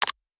Button Click

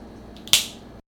Bone Crack

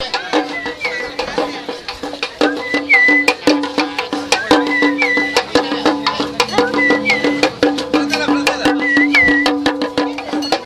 Drummer

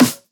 Driven Snare

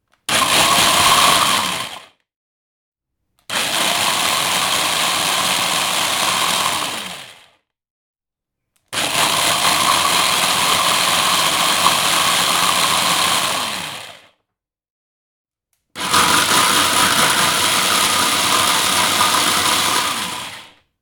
Blenders

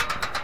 Metal Railing

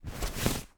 Cloth Bedding Brush Sweep

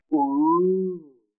"Oooo"

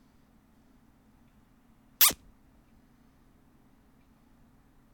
Zip Tie

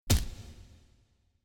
Hitting Sandback

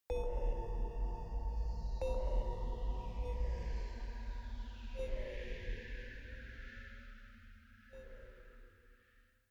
Ghastly Strange Sounds

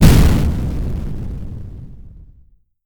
Expl

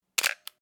Vintage Camera Shutter Firing

